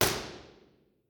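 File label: impulse response stereo; spring lane building university york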